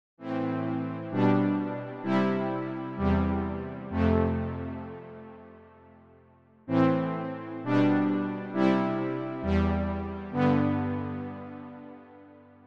Chorus Brass

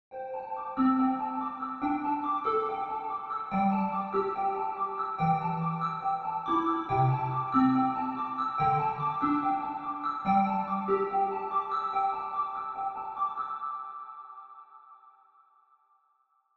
Square Pluck

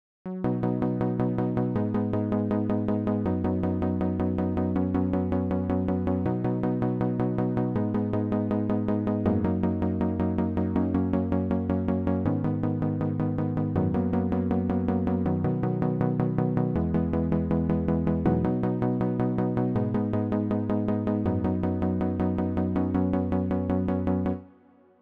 Tack Sequencer

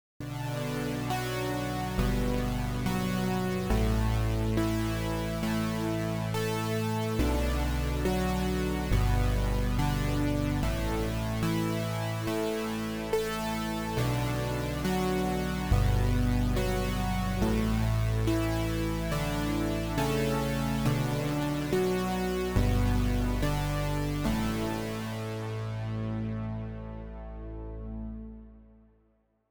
Distorted Synth

